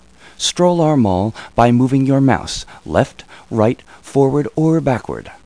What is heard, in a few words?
om; Odyssey Incentive Vacation Travel Planner